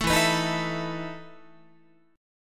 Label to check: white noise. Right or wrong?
Wrong